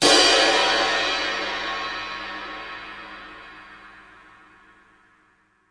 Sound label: pearlkit ride crash, Pearl drum kit; REAL LIVE KITS